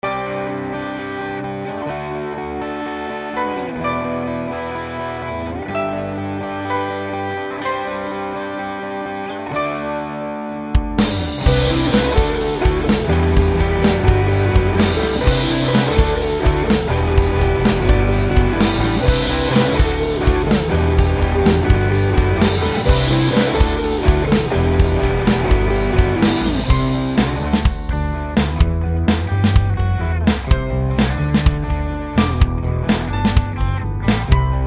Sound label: keyboard, music